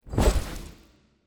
unit swap; player

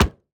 environment hammer hit

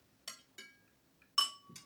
ambience room butchery working layer

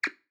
ambience room secret room wisp ready

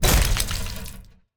Box Break Metal; TANK; 3Souls

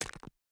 environment pickaxe hit stone

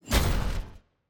Enemy Shields Get Hit; TANK; 3Souls

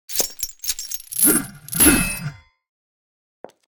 demoness strike

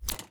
ui submenu units close

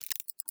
ui time control

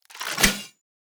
lance skeleton strike

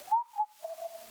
ambience room butchery whistle

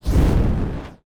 spell fire throw; player

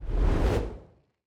ui arena spell draw end

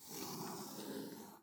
ambience room shadow room working layer

